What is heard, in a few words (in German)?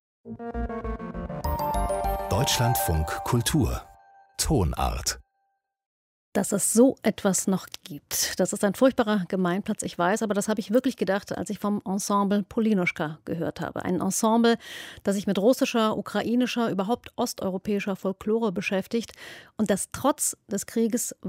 ensemble ukrainische und russische folklore